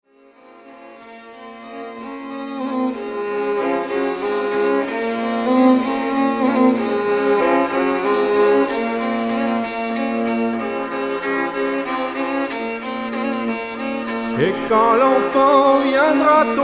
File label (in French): trio violon